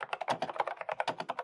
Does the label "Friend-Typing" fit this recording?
Yes